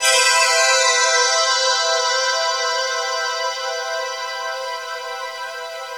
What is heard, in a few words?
Dream Pad (min7)